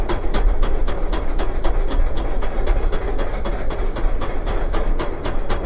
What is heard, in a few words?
slow, RollerCoaster; Dreamteck; Splines